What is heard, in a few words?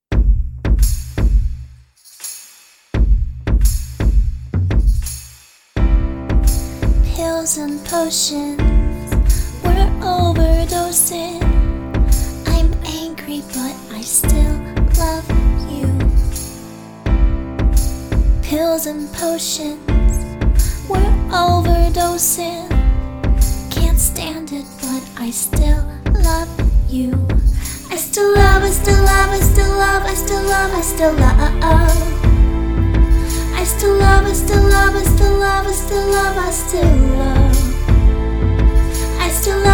[Rap]